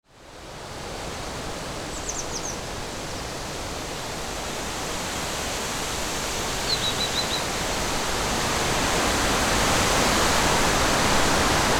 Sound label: windy forest ambience; nature